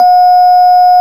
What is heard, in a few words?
EP C4